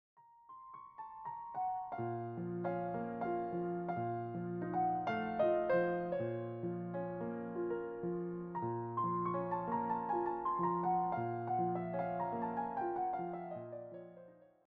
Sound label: Nocturnes In B Flat Minor Larghetto, piano; chopin nocturne selections vol 1